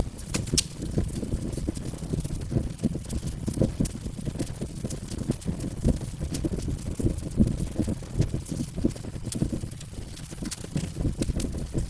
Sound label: fire